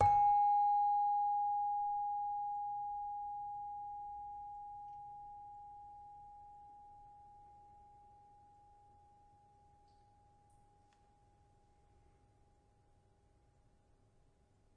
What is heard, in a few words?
Saron, Indonesian Saron (Multisampled); WORLD